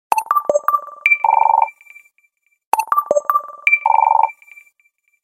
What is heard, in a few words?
Minimal tone; Samsung; GT-i8700 Omnia 7